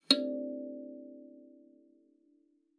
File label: KALIMBA D5; Xsample VOL-7